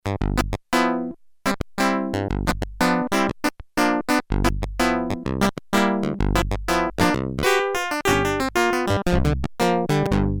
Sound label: Clav Slightly Muted; Sequential Circuits Prophet-5 (Ver.3); Factory Patch; File 2 Programs